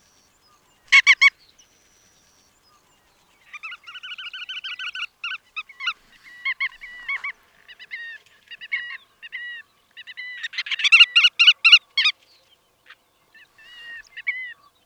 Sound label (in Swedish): Myrspov